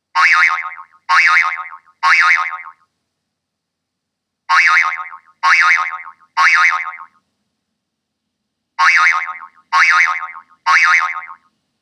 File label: Boing